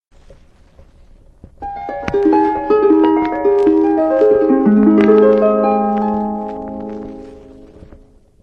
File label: original harp; 1960 Original Harps